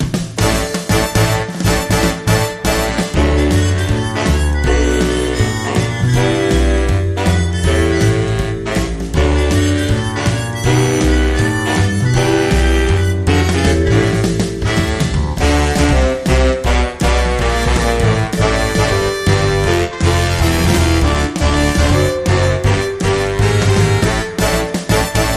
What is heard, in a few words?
Big Bad Brass